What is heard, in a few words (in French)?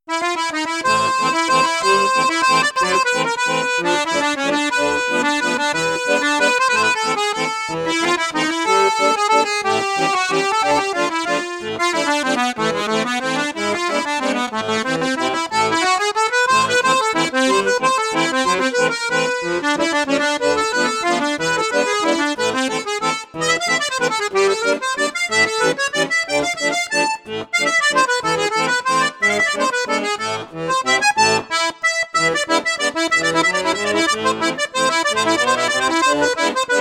registre flute juste flute haute piccolo, accordeon; cavagnolo vedette 10